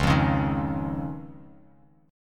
C sharp Major Add 9th; C#add9 strummed; JGuitar

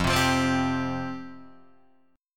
F Suspended 2nd F Guitar Open D

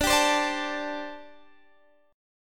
D 7th Suspended 4th, D, down, Guitar Open D

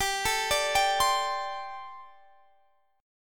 G Suspended 2nd Suspended 4th; Gsus2sus4; arpeggiated; x,22,19,19,20,20

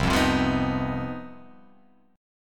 C Minor Major 7th Double Flat 5th chord

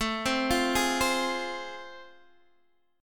A Minor Major 7th Sharp 5th, Guitar DADGAD

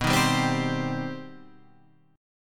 C Minor B; Cm/B strummed; JGuitar

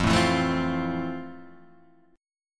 F Major 7th F; FM7 Chord {1 0 2 2 1 1} strummed

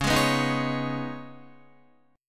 Db 7th Suspended 2nd Db, down, Guitar; Db7sus2; strummed; tuning=4E,4A,5D,5G,5C,6F

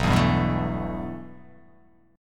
B 7th Suspended 4th Sharp 5th

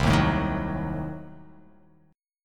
C Diminished 7th down

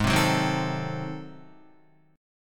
G sharp 7th Flat 9th G sharp down Guitar, chord

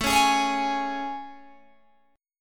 A Major 7th A, Guitar, Open G

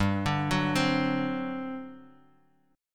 G Major 7th Suspended 4th G, Guitar Open D; down

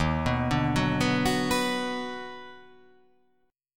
Eb Minor Major 7th Sharp 5th Eb down, chord; EbmM7#5; arpeggiated; JGuitar

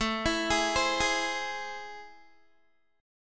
A Minor Major 7th, down, Guitar; AmM7; arpeggiated

